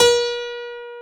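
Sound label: dance piano A#0; Best Service Advanced Media Trax Modern Composer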